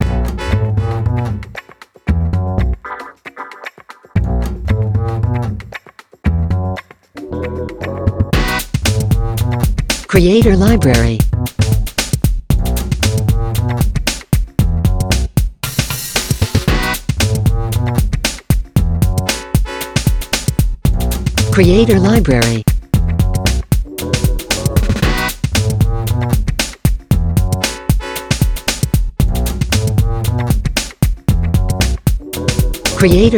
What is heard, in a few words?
Brought Upright, Funk Groove